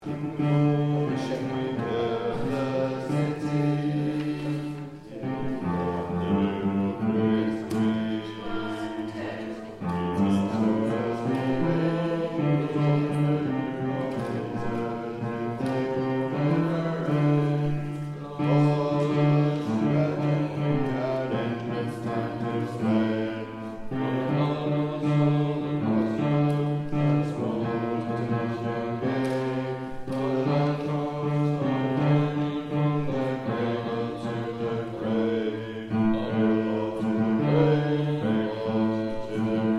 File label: Bass